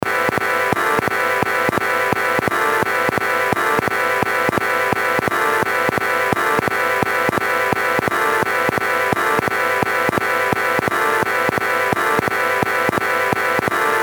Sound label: wersi